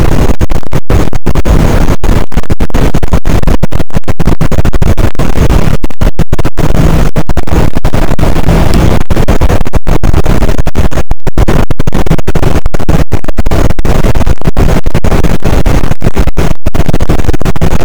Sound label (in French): cachalot negatif, boat, Physeter Macrocephalus